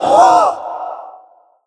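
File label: suitor attack, creature; GammaAge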